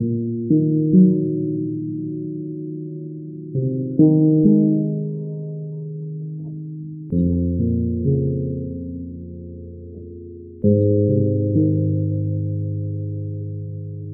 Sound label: keys, Sad Loops